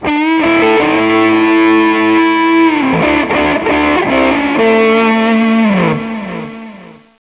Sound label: guitar